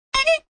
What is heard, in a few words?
Toy Honkx, Single toy honk.; Toy Car Sound Effects; Toys